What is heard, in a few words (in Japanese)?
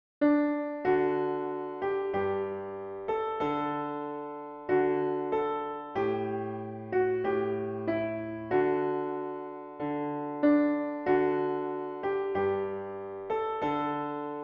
埴生の宿（ピアノ）